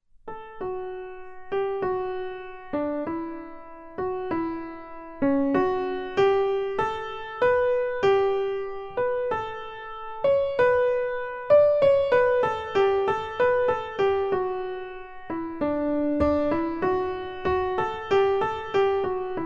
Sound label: melody